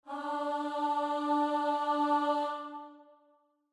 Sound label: starting note, voice; moderato